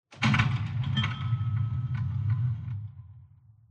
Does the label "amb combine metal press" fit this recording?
Yes